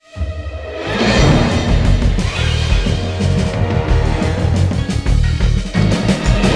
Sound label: Boss Fight 177 Bpm Intro; We Happy Few